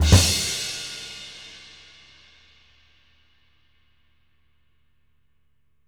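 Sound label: big end, jazz crashes, Living Drums; Sampleheads; Peter Erskine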